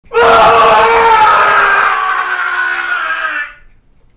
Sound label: distorto screamo